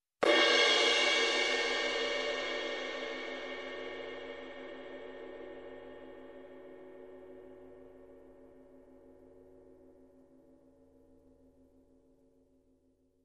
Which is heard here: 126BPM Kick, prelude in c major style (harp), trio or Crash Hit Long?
Crash Hit Long